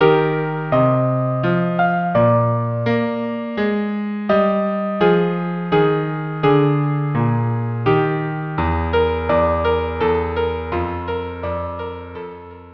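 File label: violin cello duo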